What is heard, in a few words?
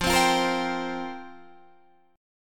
F Suspended 2nd Suspended 4th F down Guitar DADGAD